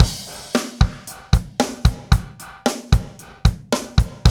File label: loop, Roland; Sound & Vision - Gigapack I